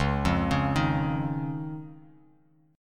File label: Db Major Add 9th; arpeggiated; JGuitar